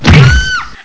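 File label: female hit; Games.Carmageddon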